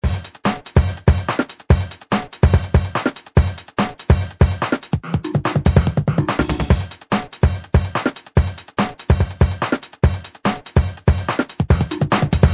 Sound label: Drum kit, Synthetic Drums; NI